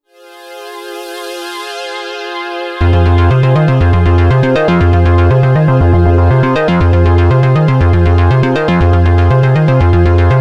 Waldorf Quantum

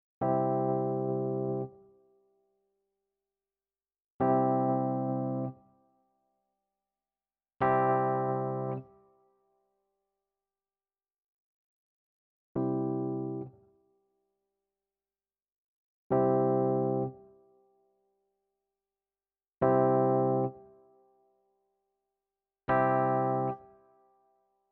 RD-800 E-Piano Tone Color

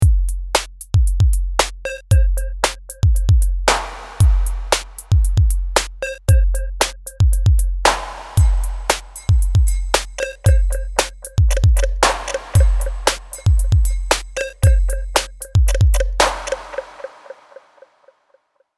Audiothingies DoubleDrummer Dubby Kit

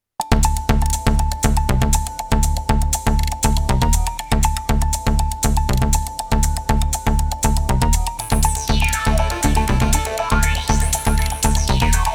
Korg Prophecy VST; in the street with prophecy

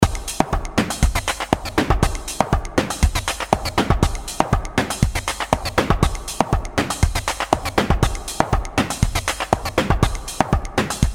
DrumComputer; ET Eyeshine

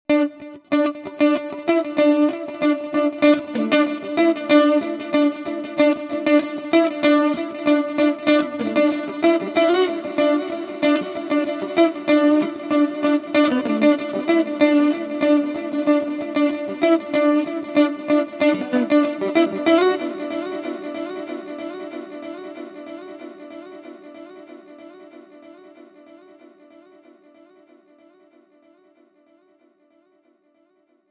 4 Tap Delay; Behringer X32

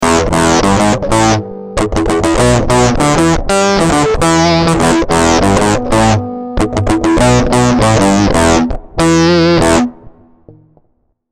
Synth

